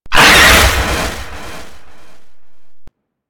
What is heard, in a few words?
Golem pain, enemies, vocals; Warframe